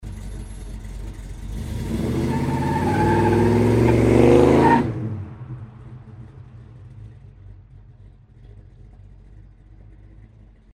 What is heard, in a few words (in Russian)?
rezkij start s vizgom